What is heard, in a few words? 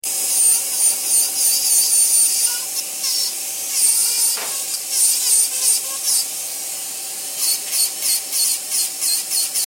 drill